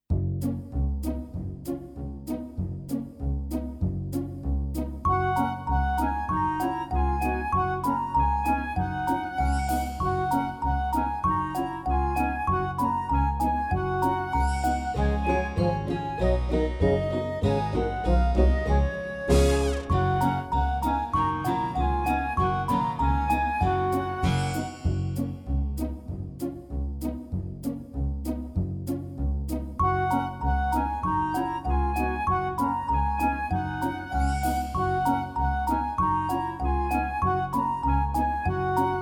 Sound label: Melody